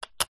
foley money australian 20 cent coin set down on tiled table gently; zapsplat